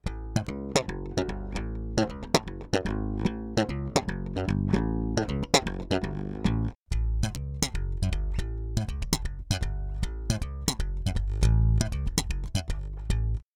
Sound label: Passive Slap flat then enhanced